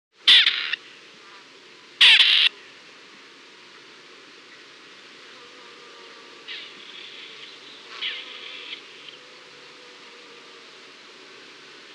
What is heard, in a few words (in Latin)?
Perdix perdix